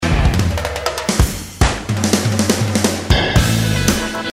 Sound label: Fill, Drum Sounds